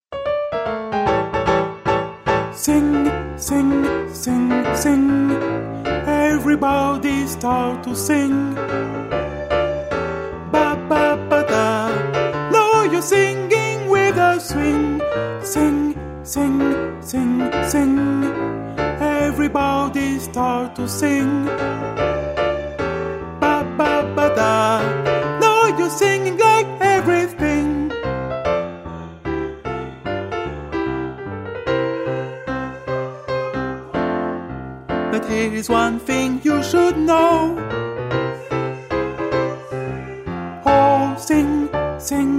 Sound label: ALTO; LENT